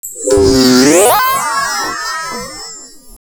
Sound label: fart; fl studio